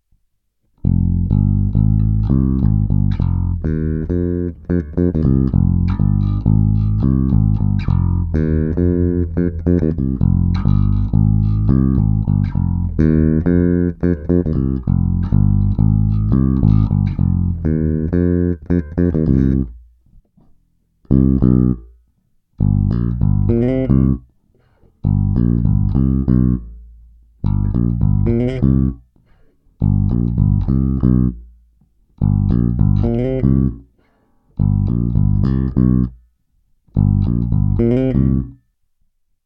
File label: bass